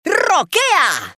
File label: rock; rocktopus